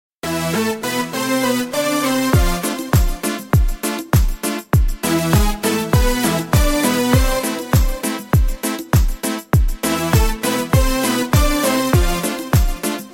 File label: instrumental